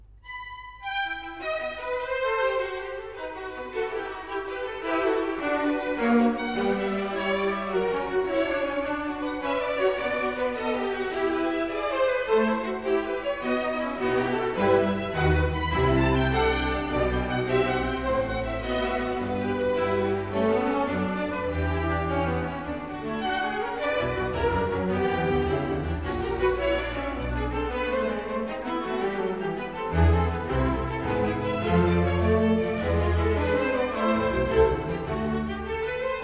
Concerto Grosso in E major, Andante; Handel Masterworks; Klassika

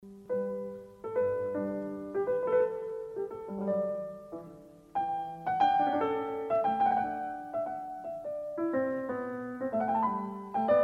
Bach Goldberg Variations

